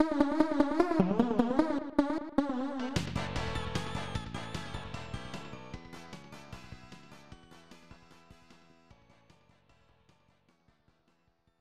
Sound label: Gutter Ball; super bowling; snes